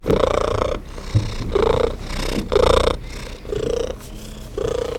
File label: purr